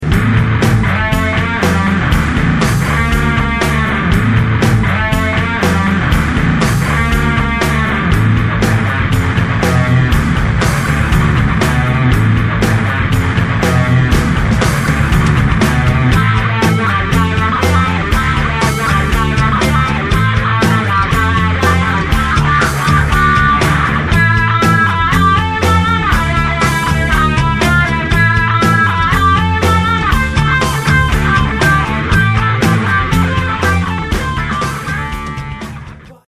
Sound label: lead guitar sample